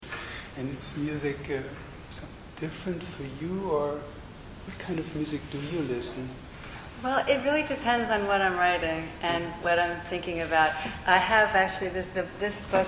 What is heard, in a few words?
music